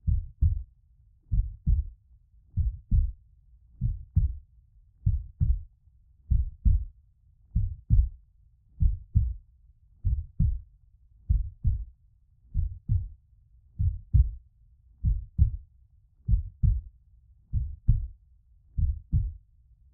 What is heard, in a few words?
Heart Beat Very Calm Rhythm Blood Flows In The Veins